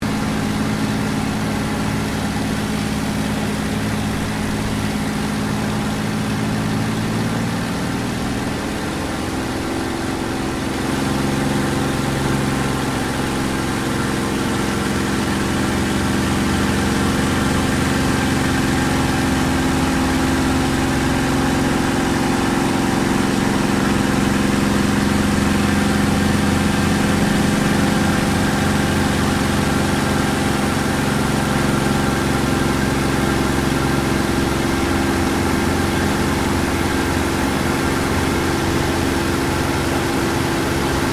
AIRCRAFT CAC CA-18 MUSTANG MK23 FLYING INTERIOR LOOP; CA-18 /P-51 Mustang; Airplanes; Vehicles; Aircraft Collection; Twistedtracks